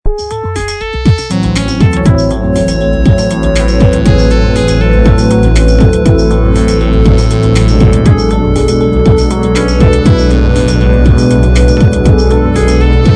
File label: moog